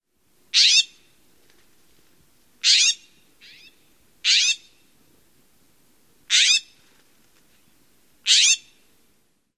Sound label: calls